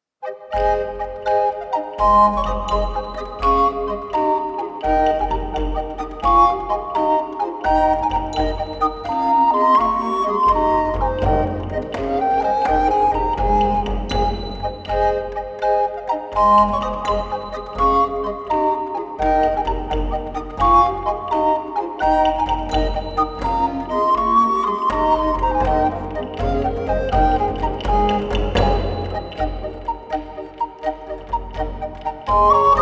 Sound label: Courante